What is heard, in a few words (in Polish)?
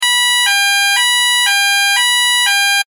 ton